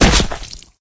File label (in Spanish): caida